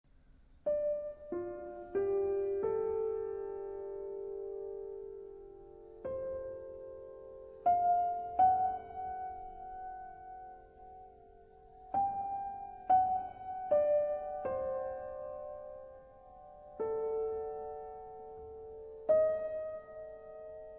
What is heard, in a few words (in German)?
Klavierstuecke